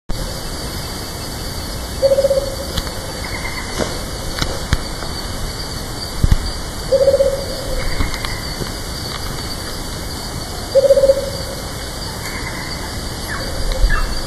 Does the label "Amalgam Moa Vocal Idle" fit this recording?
No